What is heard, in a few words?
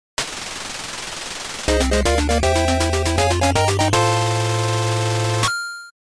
Gain a Level, Jingle